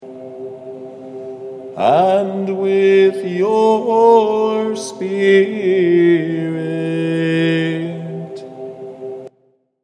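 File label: And with your spirit, hymns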